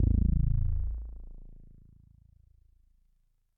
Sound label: Even harmonics A